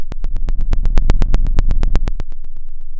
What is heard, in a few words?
pop, Sound of a fire crackling